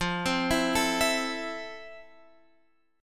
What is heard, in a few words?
F Major 7th F, Guitar, Open F; arpeggiated